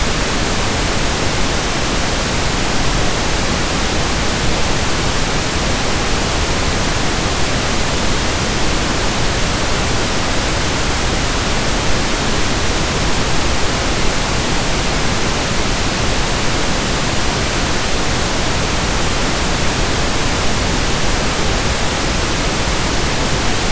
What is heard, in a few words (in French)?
Bruit rose; audacity